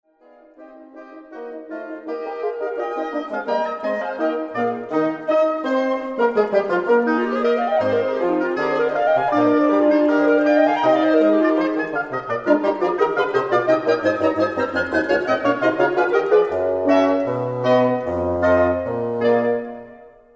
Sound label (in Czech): dech kvintet